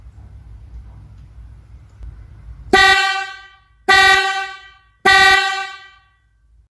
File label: Bass Horn 390 430Hz; OTO